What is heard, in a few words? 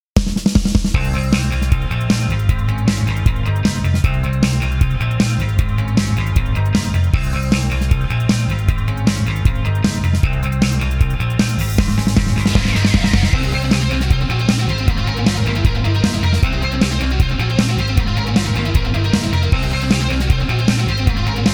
Rock Mix WET